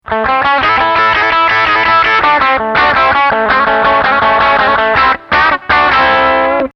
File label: Rhythm Overdrive